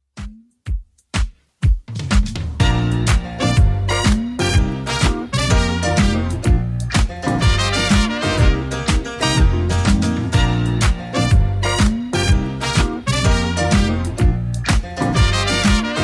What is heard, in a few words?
70s 124bpm; Redrum